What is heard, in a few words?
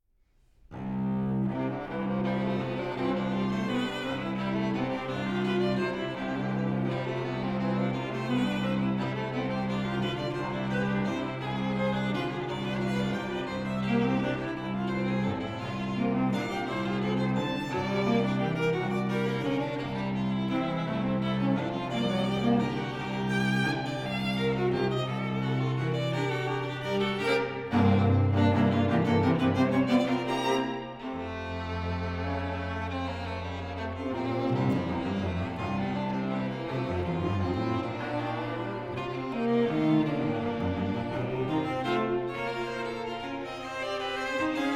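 String Trio; Wheelock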